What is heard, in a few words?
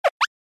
Motion Pop